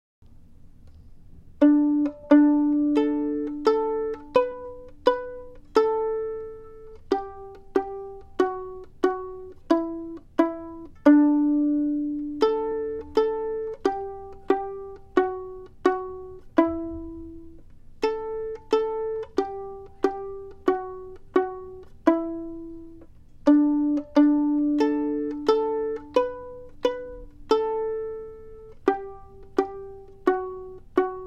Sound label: Twinkle Pizz Vl